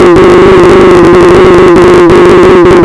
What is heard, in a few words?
FX, Drum Machines; Fricke Schlagzwerg